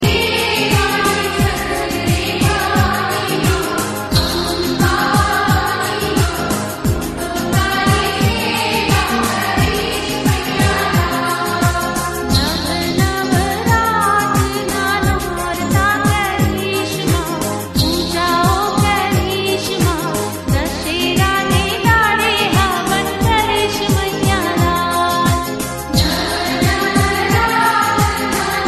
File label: Garba Song